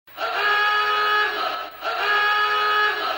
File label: submarine sound; ringtones; sms